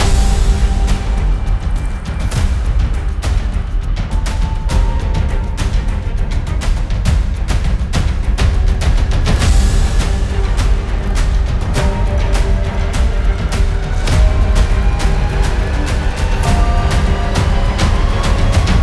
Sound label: Film Trailer Master